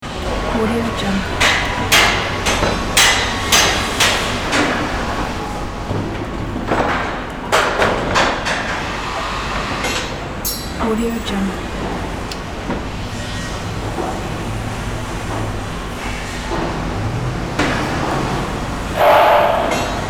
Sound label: construction site ambiance; erronmader